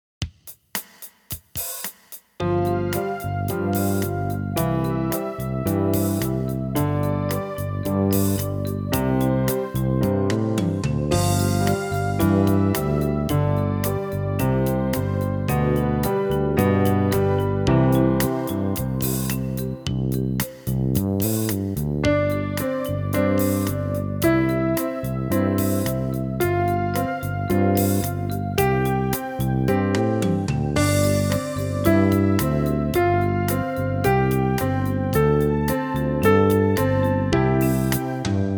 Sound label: Interval Studies Slow, piano